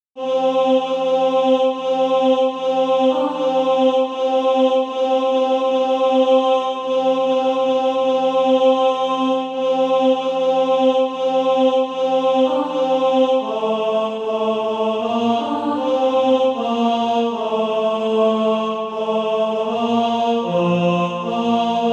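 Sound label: tenor, Practise Music; SATB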